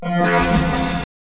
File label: bing